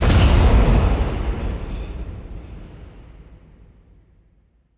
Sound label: Pillar Start; moul-assets